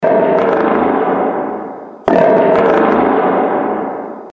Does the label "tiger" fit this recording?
Yes